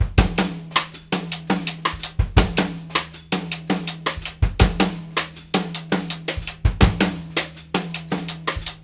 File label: 6 8 FEEL, Drumworks; Sampleheads; New York City Drumworks VOL-1